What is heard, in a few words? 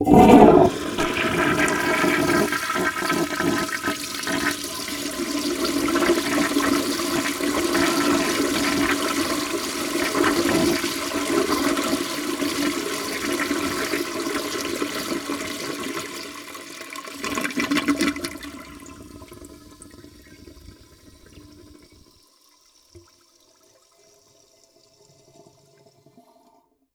Long Toilet Flush